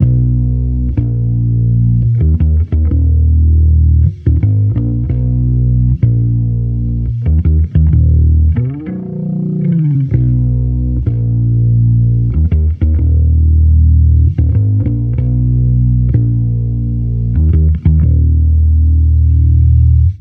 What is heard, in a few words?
Amped loop 95 Ebm, Electric Bass Loops; Slate Digital BASSHOLE Sample Pack